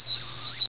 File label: whistle